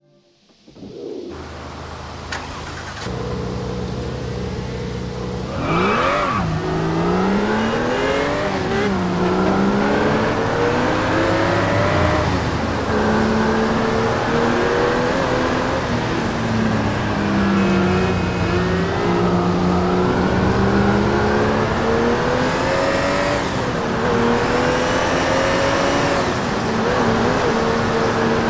ruf rt 12; TDU-1

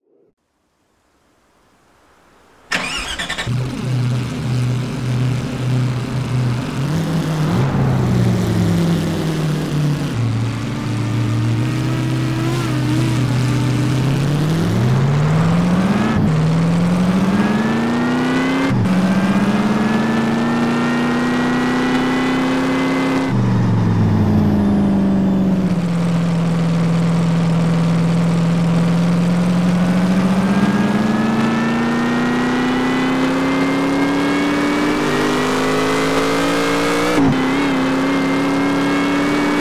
ford gt

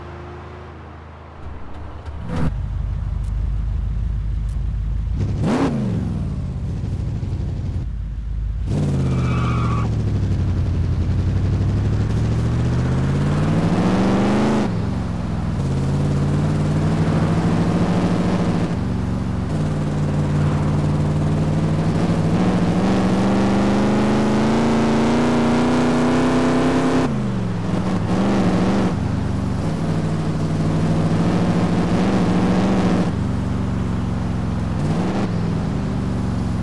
dodge charger rt; 1969; TDU-1

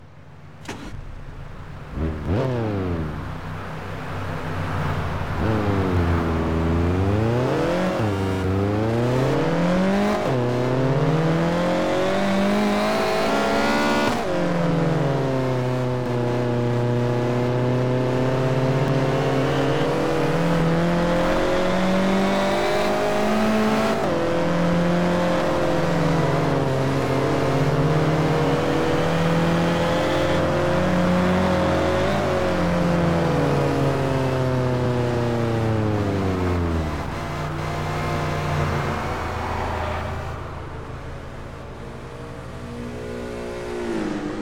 honda civic si coupe stock; 2013

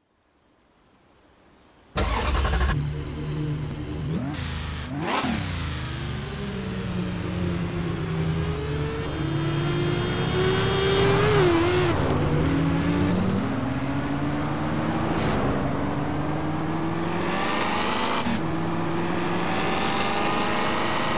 ferrari f360 stradale racing

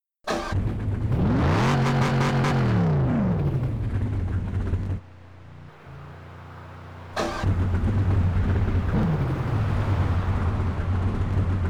land rover range rover sport hse l320; 2009; TDU-1

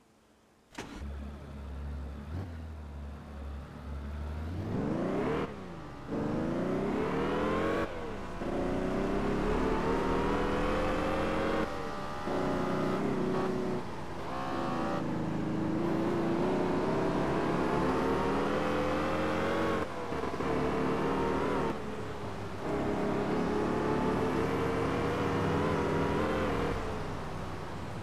mercedes-benz sl 65 amg; TDU-1

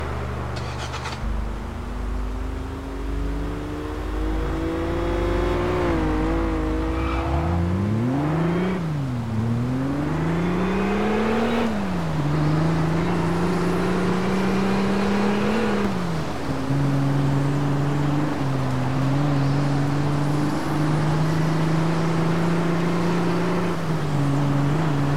chrysler crossfire srt-6 roadster; TDU-1

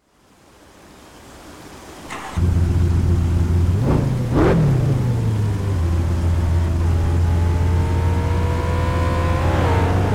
audi rs4-r8

